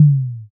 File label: tsuzumi